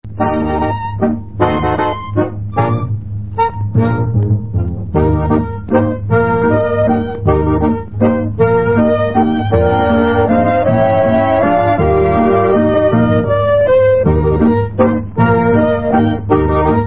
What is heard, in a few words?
Waltz; Black Hawk Waltz